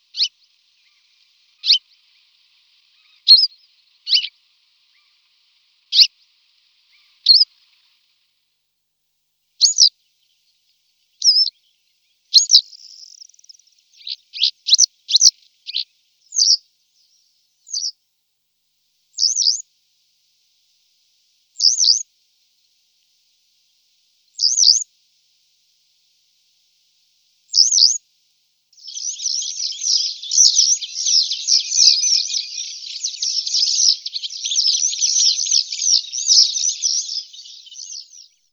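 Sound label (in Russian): Tryasoguzka jeltaya, western yellow wagtail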